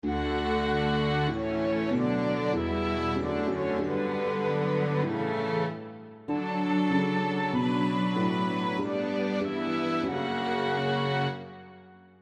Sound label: Karaoke; Taki au Eiki